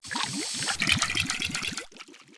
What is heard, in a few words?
make potion; schattenlander